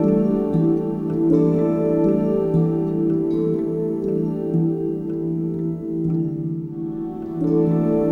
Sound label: Pretty Poink End, Pastoral; Sonic Foundry (Sony Creative Software) - Friendly Fire